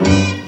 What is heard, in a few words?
String n Horn one shot G 2; Sound FX